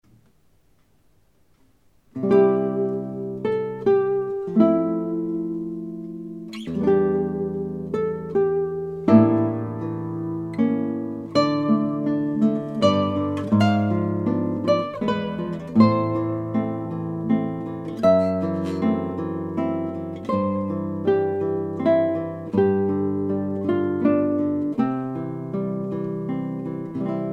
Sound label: silent night, guitar